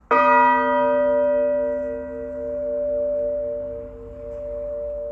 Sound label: bells of sussex; balcombe